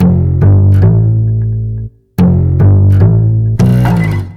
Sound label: Synth Bass